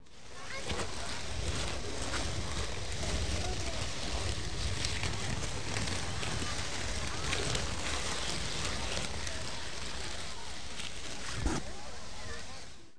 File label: plant